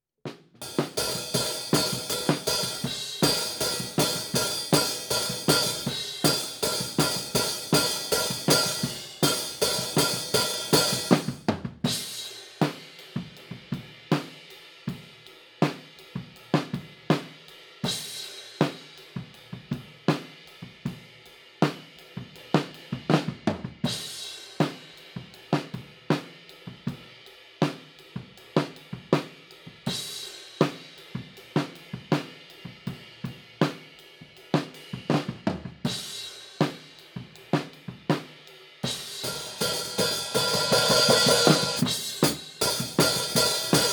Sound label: HiHat, 160 BPM